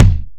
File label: Kick, Drums